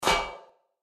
rail end

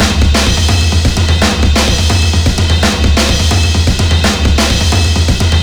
drums, horde; music; szf; zombat

